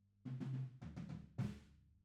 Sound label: HAMMOND